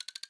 Mouse Keys ON; accessibility; CoreAudio; SystemSounds

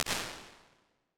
Piano Reflection; Medium Spaces; Apple

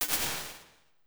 Slap Reverb, impulse responses; Small Spaces; Apple

